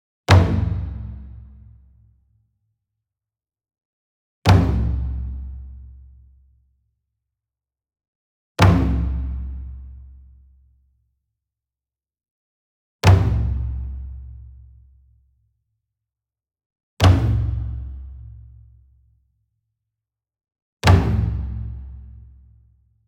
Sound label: Single Drum Taiko 28 Inch Flam Hard Beater Medium; boom library; Cinematic Strikes Construction Kit